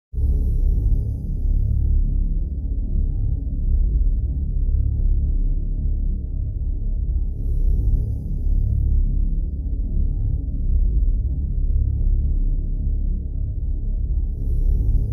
Shimmerhum Drone; Fired Earth Music

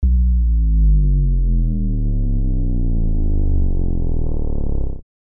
Depraved Drop Hit Downer; Ninja Tracks

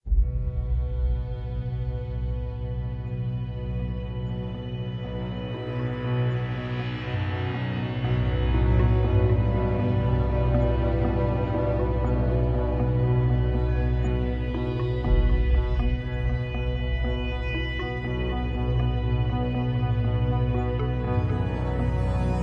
Gloom Ambient

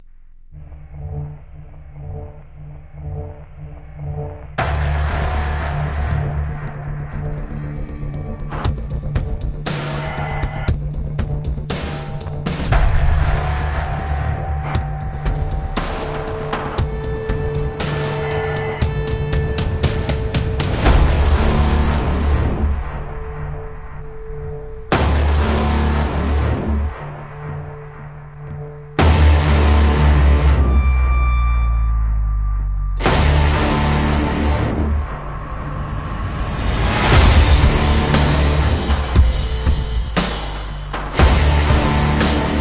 No Guitar Lead No Rise

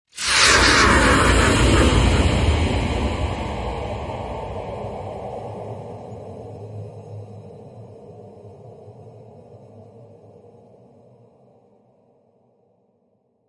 Impacts Demolition; Gothic Storm Music; Advanced Sound Design

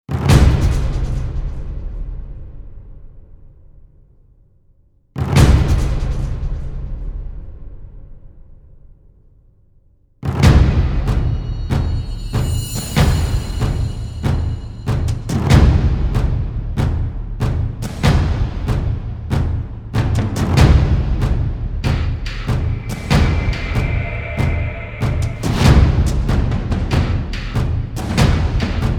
Drums Only Version

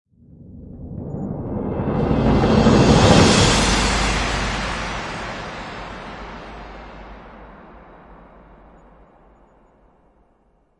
Cres Big Crescendo; Brand X Music; Brand X Music Catalogue - Editor's Essentials

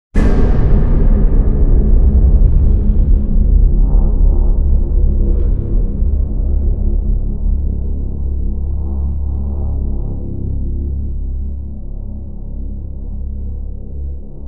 Boom Drone; Epic Tools vol. 1

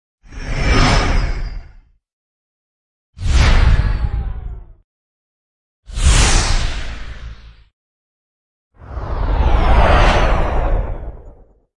Swooshes (Bending, Fast); Epic Tools vol. 1

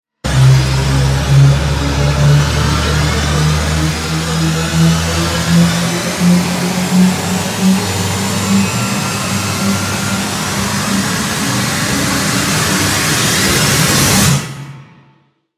Moon Rise; Ninja Tracks

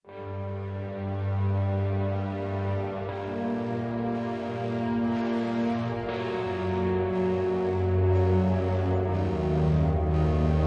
Doom Ambient

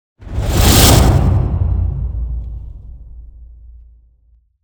Tide Wipe; Ninja Tracks

